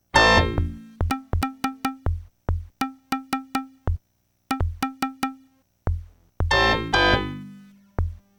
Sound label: Attract Mode (No Sound Effects); Pit-Fighter; Genesis